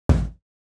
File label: dubstep